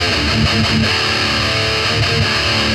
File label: METAL